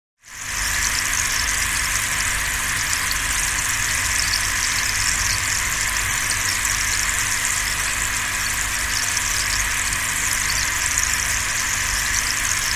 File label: Fish Tank Filter, bubbles